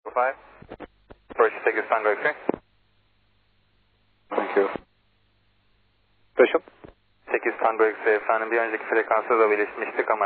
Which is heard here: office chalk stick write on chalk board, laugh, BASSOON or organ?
organ